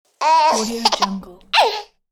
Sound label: baby laughs; human sounds; خنده ی بچه